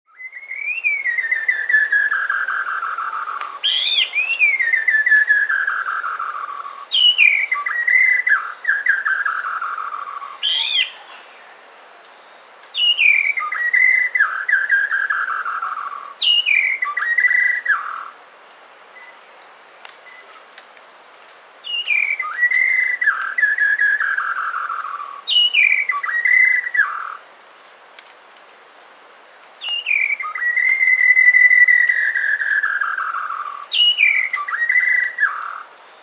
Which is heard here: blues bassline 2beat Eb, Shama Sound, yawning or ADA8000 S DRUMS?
Shama Sound